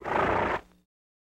Horse Blow Long

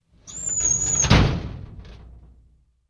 Drawer Metal Close; drawers

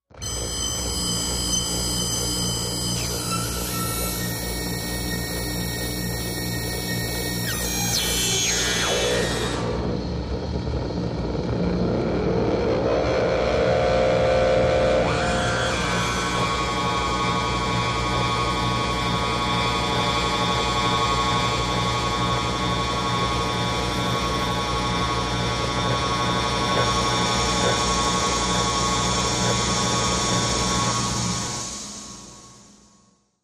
Diode Grinder, Machine, High Grin; centrifuge spin

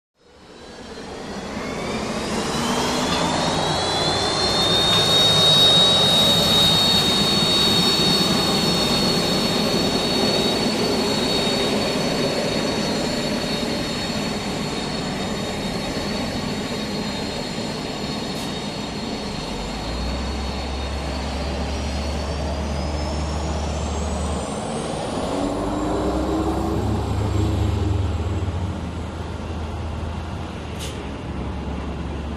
Hydroplane Dock Amb

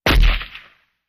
Ak47 Single Shot

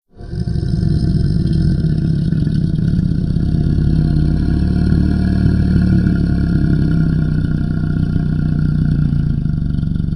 Monster Low Growl, monsters

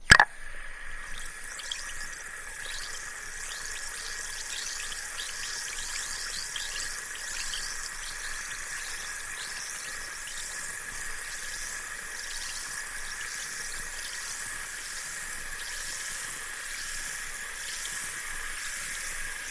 Ambiance Fizz Swirl, bubbles

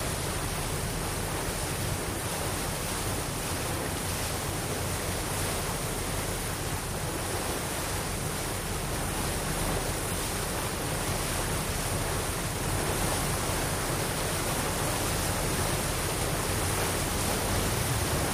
Water Atmos Light Us, bow wash

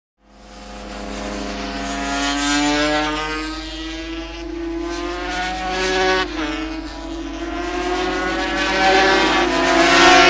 On Circuit 500 Cc Moto

